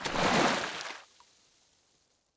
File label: water wade; player